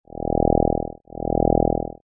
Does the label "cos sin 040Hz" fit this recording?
Yes